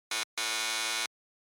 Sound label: Quiz Buzzer Multi; クイズ